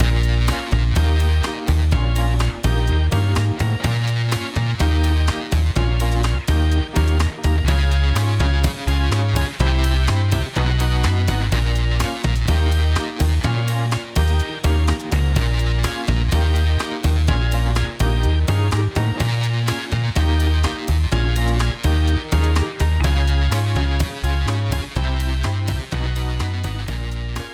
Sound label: Main Background; Fibbage 3; Jackbox Games; The Jackbox Party Pack 4